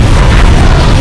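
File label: Rckt Fly, rocket fly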